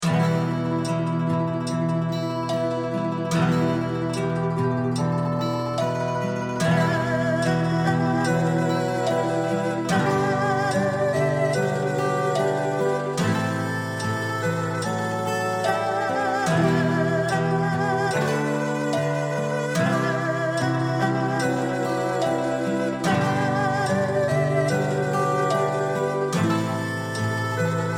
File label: bgm village; goken